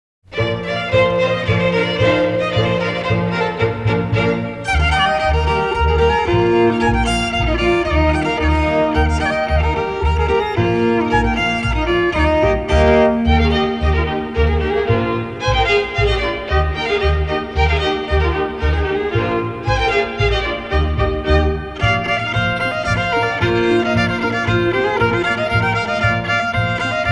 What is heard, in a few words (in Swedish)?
Parisarpolka